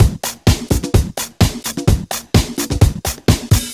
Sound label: Housebeats 128BPM; Vengeance Samples